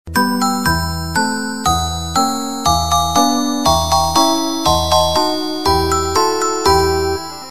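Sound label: sugar plum sms, sonnerie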